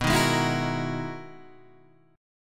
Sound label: E Major Sharp 11th B